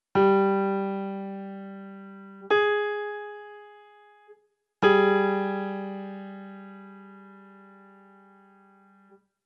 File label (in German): intervall g oktaviertes as b9